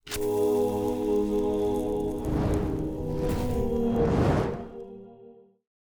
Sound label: Light up